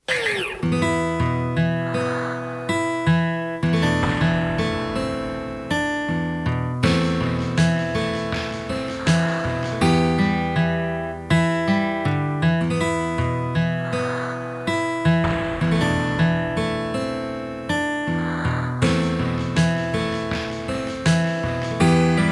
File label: Funky